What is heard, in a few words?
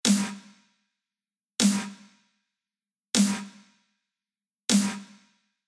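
Reverb Delay Basic snare drum Delay On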